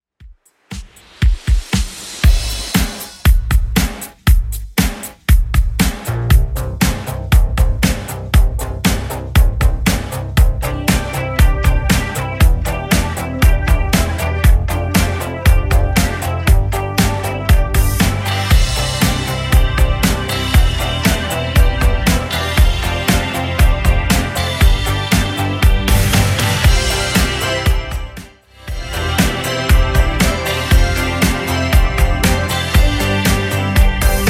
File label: 80s Redrum CLEAN